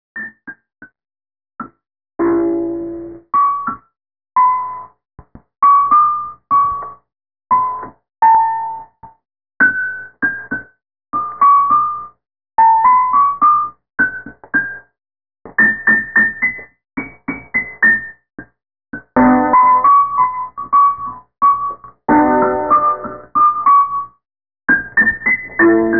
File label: piano